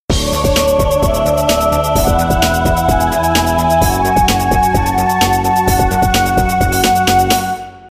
pad

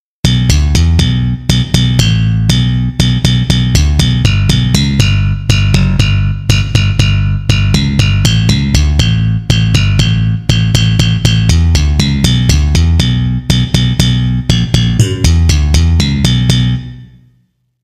chopper bass

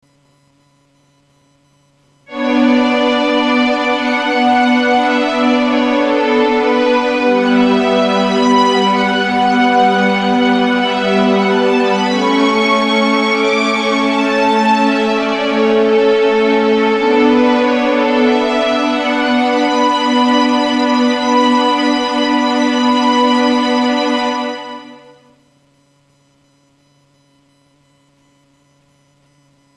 slow str

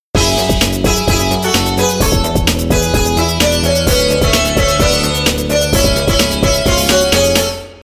suzu

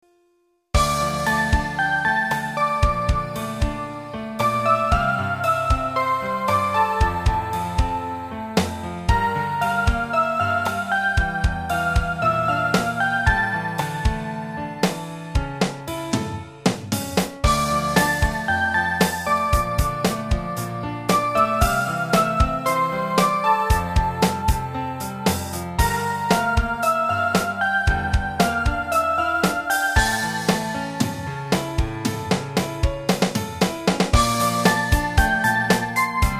arrange piano

